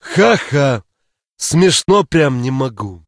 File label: bandit smeh; STALZONE; minecraft